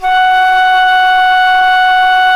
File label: flute, C flute